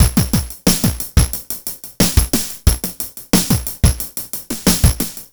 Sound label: Noise Beat; Drums & Drones